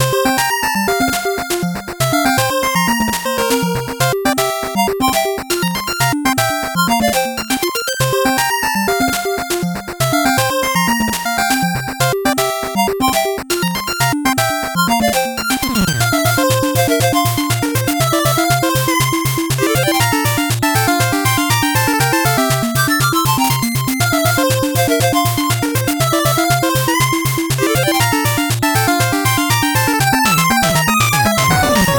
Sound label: 8bit bgm normal